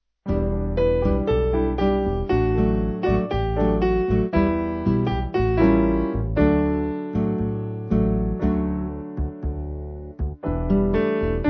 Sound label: Jazz Piano